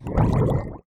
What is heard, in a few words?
Drown, Water; Barotrauma; Subsurface